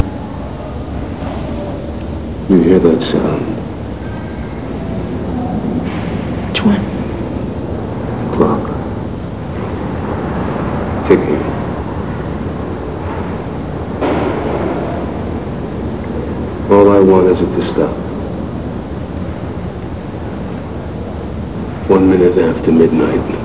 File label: Ticking